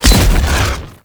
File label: Bow Arrow Rain Explosion; Ultimate Abilities; Madurai; Warframe; Focus